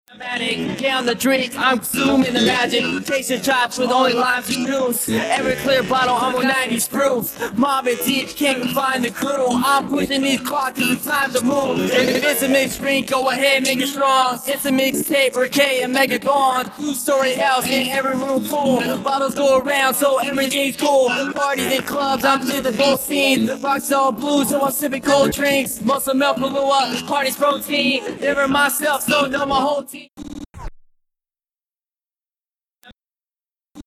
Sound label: Acapella